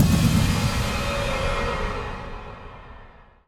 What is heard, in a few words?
Hi Hit